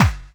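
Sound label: snare drum bass drum, Dance Mega Drums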